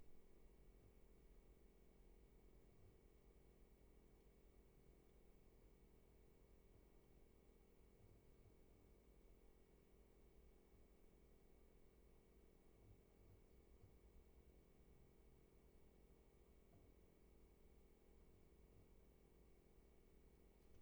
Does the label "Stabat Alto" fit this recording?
No